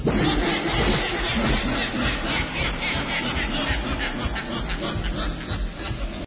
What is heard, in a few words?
laugh; predator